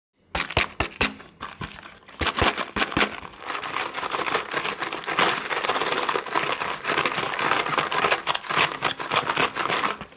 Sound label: Paper Shaker